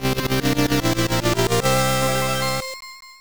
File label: Fanfare of Fighter Tournament; Lodoss Tou Senki; PC Engine CD-ROM2 Super CD-ROM2